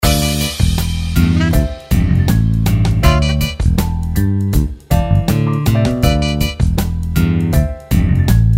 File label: Free jazz